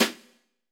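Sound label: brush snare; Greytsounds; Scott Peers Sound Engineering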